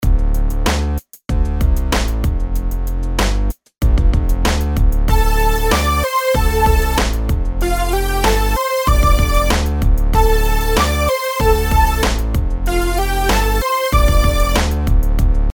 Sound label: rhythms eighths; simple 8s